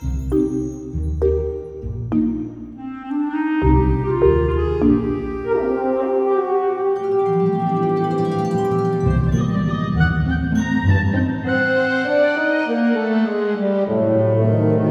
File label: Mysterious Jungle (Waterfall Cutscene); madagascar; ps2